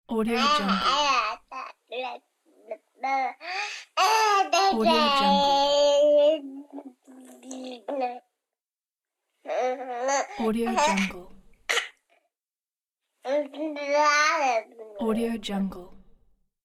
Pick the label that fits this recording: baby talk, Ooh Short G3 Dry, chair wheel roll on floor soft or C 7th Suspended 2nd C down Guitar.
baby talk